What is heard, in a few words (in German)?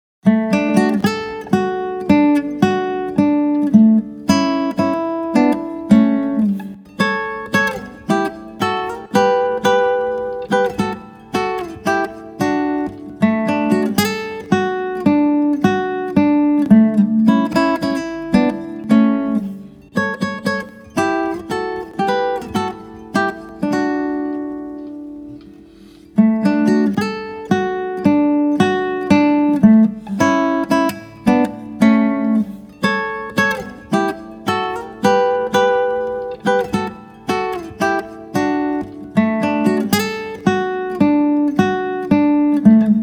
Walzer Landler Walzer Playback ohne Begleitung